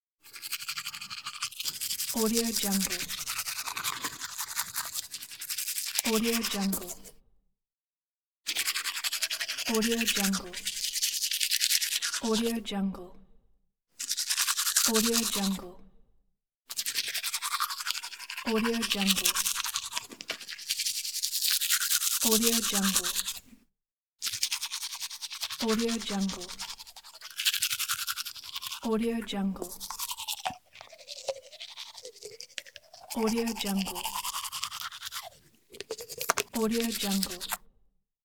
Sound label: brushing teeth; moonaudioproduction